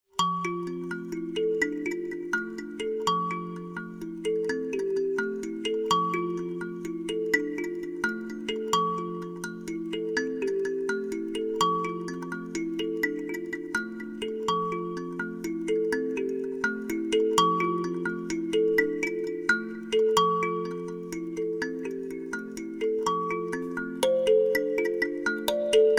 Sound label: Karimba